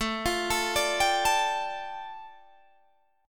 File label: A 7th Suspended 4th Sharp 5th, A, down, Guitar, DADGAD